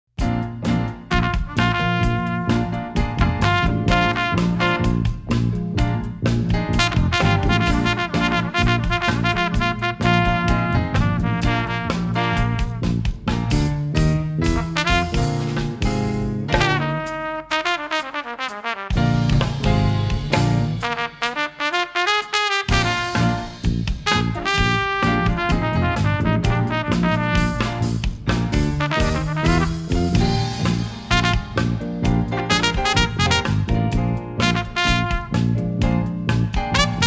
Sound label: sample solo rock trumpet